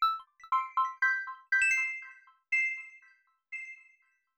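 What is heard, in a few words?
granular bell accent C major; accents; bespoke - Floral v.2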